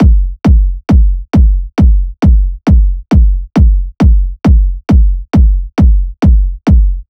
Kick Loop 135, Techno Drums

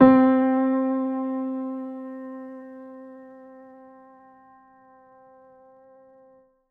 Piano C, Lo-Fi; One Shot; Ghosthack; Golden 94 Dm; HipHop

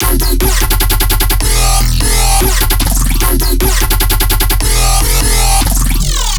Bass Loop E, Dubstep, 150bpm; Ghosthack; Dubstep Sound Arsenal

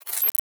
Glitch

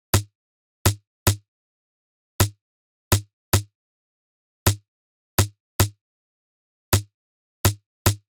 Drum Loop - 106 BPM Rim, Afrobeats; PARADOX II - Drum Loop Collection; Cymatics; Tribute Drum Loop

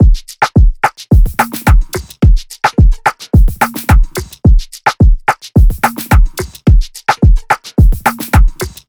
Drum Loop - 108 BPM, Afrobeats; Banished

